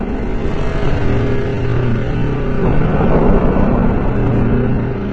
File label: citadel sick drone loop; ambient; source engine; episodic